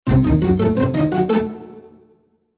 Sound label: ringer; Ringtones